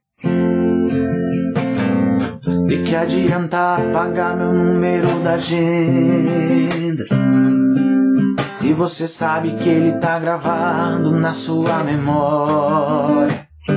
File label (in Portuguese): voz e violao sertanejo cover